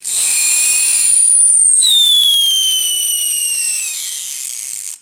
mechanical wind up toy whistle

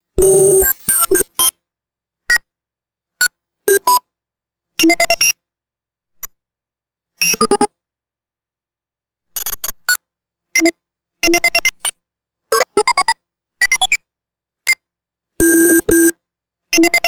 sustained synthetic computer bleeps

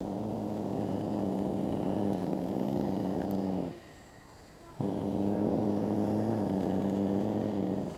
low guttural cat growling; animals

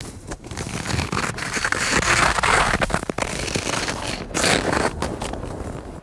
crunchy cold snow grinding and scrunching

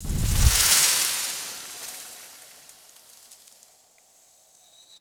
magical airy swirling wind whoosh; magic-scifi

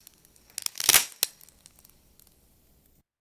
dry wooden sticks snapping and breaking; vegetation

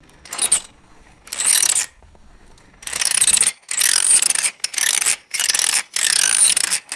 manual reel lawn mower pushed in bursts; tools

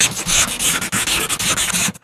rapid pencil scribbling on paper

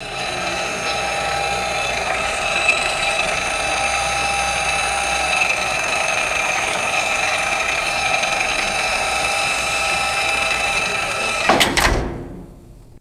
motorized cable winch operating and stopping; motors

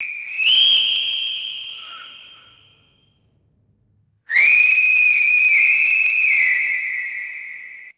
loud human whistles with outdoor echo

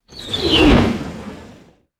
whistling arrow flying past quickly

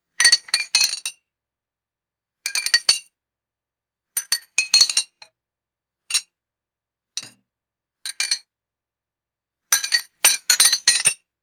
heavy glass clinking together repeatedly

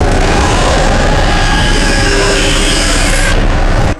chaotic screeching electrical sci-fi engine; electricity

